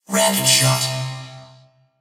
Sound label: Activated Rapid Fire; BTD6; Tron